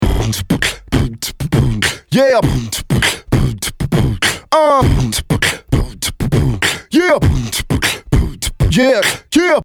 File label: beatbox